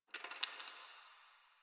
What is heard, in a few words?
Ice Crack One Off